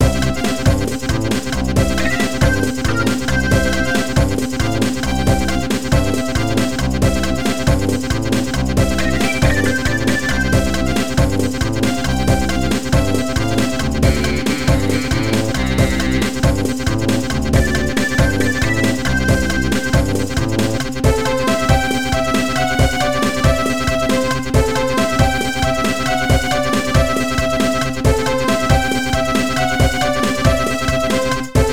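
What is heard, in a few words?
background music level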